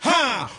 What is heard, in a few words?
ha, Voice ooh ahh